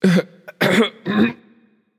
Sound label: boo hoo; smite; achilles